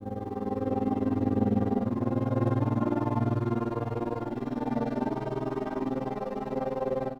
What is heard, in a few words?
strings 10Hz tremolo